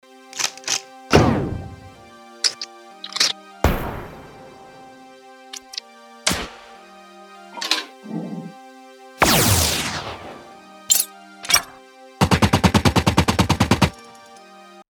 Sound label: guns